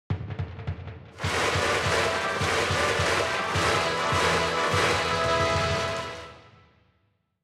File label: Ouen Brassband; ebaseball powerful pro yakyuu 2020 ps4 switch gamerip 2020